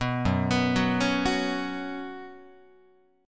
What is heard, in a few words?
F sharp Suspended 4th, Guitar; arpeggiated; 2,2,2,4,5,5; down; 4A,4E,5A,5D,5Gsharp,6Csharp